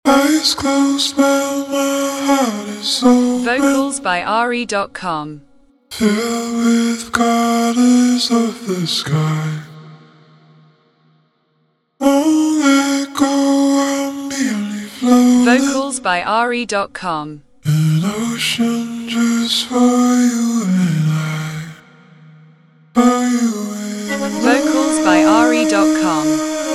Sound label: 160 80 G Maj